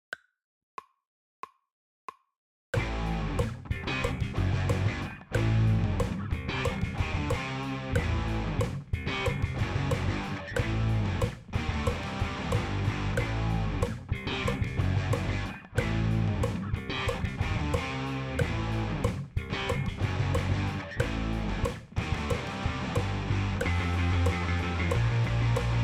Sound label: picket Line drumless